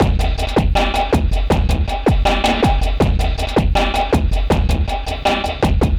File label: Trash Hopper 160 BPM